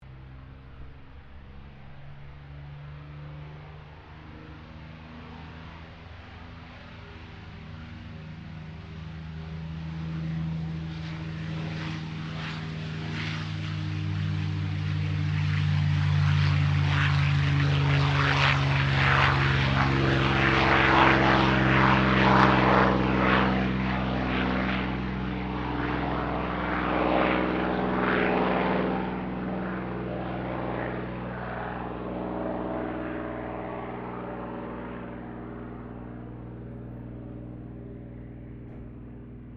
AIRCRAFT CAC CA-18 MUSTANG MK23 TAKEOFF CIRCLE; CA-18 /P-51 Mustang; Airplanes (Sound Effects:Vehicles)

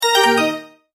multimedia tone alert; Tones; Sound Design

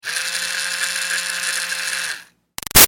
Toy Fairy Wand CU Run Short; Miscellaneous Toy Sound Effects; Big Room Sound

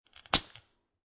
Foley, Bottle Of Beer, Open, Twist Cap Off; Twists; Objects

